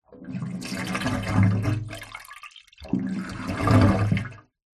household bathroom water drain gurgle; zapsplat

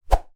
foley stick bendy whoosh air fast; zapsplat

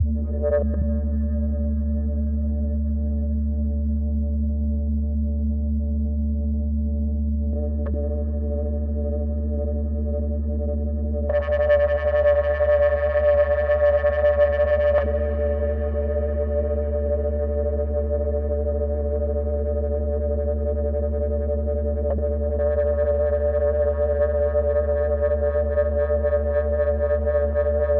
Atmosphere corrupt data stream; sumo blanco

